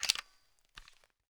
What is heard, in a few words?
m3s90 load; weapons; garrysmod; m3s90p